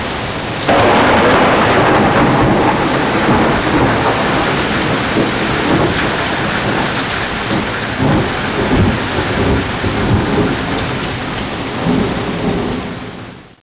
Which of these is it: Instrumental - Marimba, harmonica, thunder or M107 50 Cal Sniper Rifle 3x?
thunder